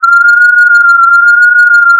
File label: distort; distort samples